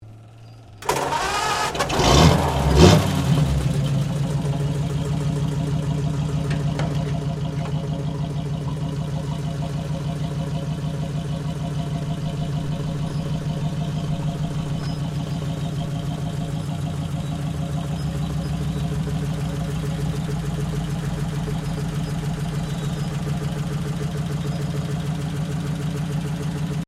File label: TANK BREN GUN CARRIER IGNITION, British Universal Carrier; Trucks (Sound Effects:Vehicles)